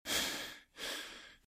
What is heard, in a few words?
Breathe Breathless; Eden; RadwinIsland